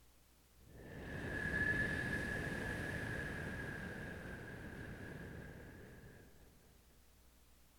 Wind Lower

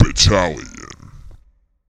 Vocal One Shot

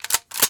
Shotgun Rack

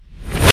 Riser

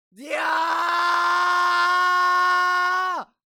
Battle Screaming

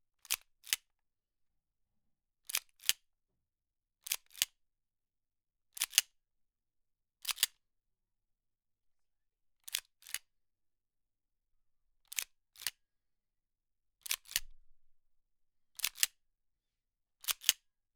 Gun Loading

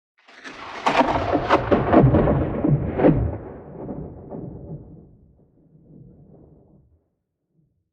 Lightning Strike

